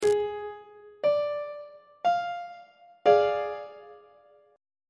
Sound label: c double sharp diminished 2nd inversion on treble clef